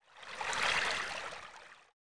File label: boat; ancient